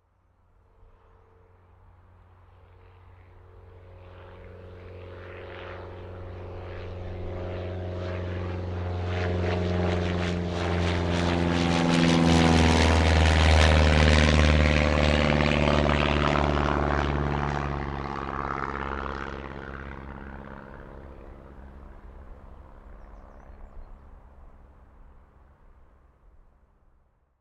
transport aircraft airplane light prop pass overhead take off